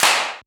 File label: Shifted Clap, Claps; FLPRO